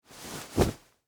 Wool Coat Adjust, Clothes, Movement, Straighten, Arm; Foley; BlastWave